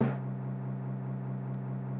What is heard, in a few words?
Instrument